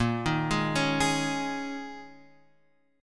D sharp 7th Suspended 4th

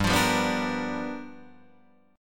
G 7th Sharp 9th G chord, Guitar Open D

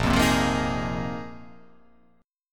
A sharp 7th Suspended 2nd Suspended 4th

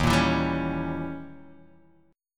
Eb 7th Flat 9th, Guitar Open G; 1,3,2,0,2,x

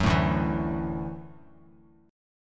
D-Major Add 9th D down Bass Standard; strummed; JGuitar

